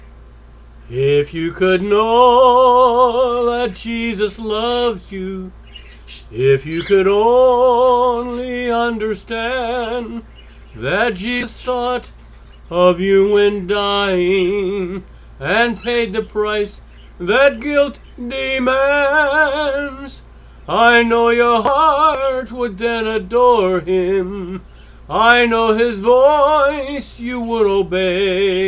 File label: Solo, violinist